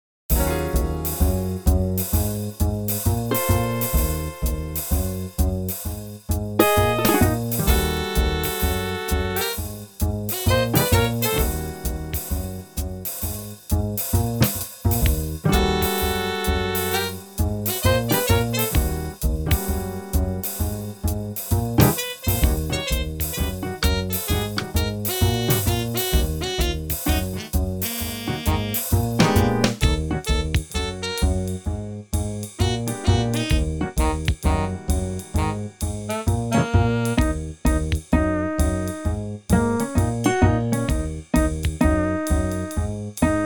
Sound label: GROOVE; darkfrcs